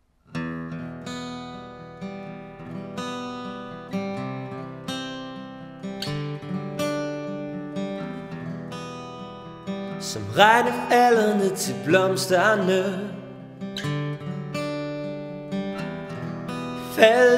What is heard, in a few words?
Medley 2 Voices